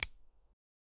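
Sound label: empty clip shoot from pistol, gun fire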